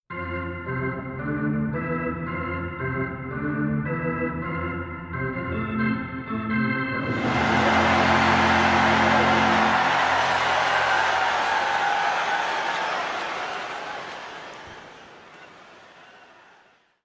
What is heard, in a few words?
ORGAN MUSIC CHARGE; Spider-Man 2 2004 ps2 gamerip